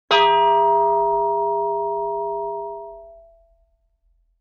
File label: Brake Drum Hit Low; Vintage Cartoon; Drums & Percussion